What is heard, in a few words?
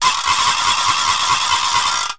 Noisemaker; Music